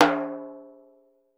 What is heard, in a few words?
timbale, ethnic set; Voice Crystal - Steve Reid Definitive Percussion